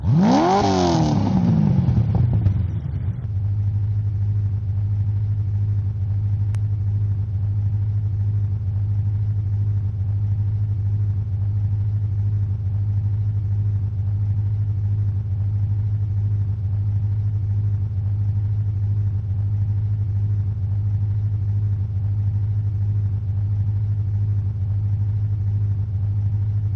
start engine; BALLY; voice; CORVETTE

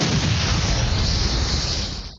rain; WILLIAMS; INDIANA JONES; Indiana Jones Original

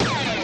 shoot

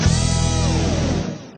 combo; BALLY; CORVETTE

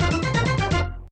tone down; jingle; WILLIAMS; INDIANA JONES; Indiana-Jones-Original

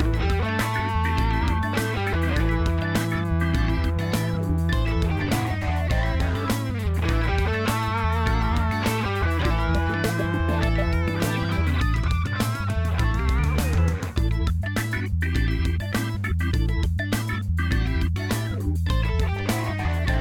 greed; BALLY; TWILIGHT ZONE; Pinsound; Twilight Zone Reorchestrated

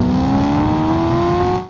car speeding; BALLY

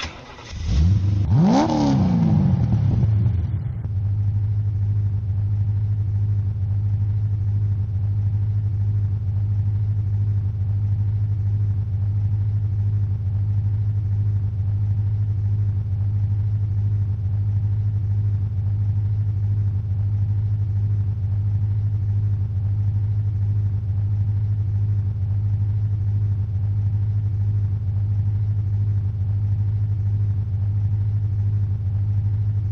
start engine; BALLY; voice; CORVETTE

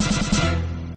mode bonus; jingle; WILLIAMS; INDIANA JONES; Indiana-Jones-Original

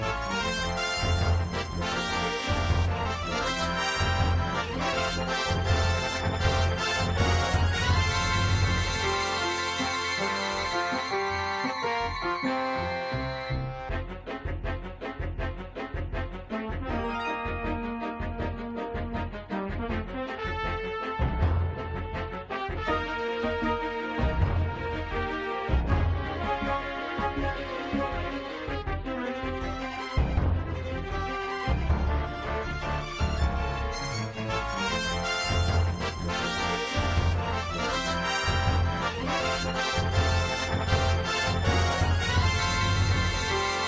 game theme, music; WILLIAMS; INDIANA JONES; Indiana-Jones-Original

